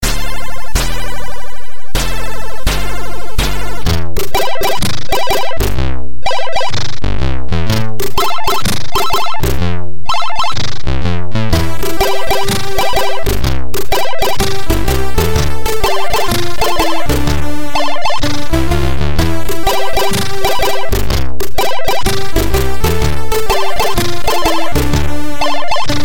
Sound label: C64; Giana Sisters